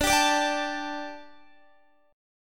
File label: D Suspended 4th D, down, Guitar; Dsus4; strummed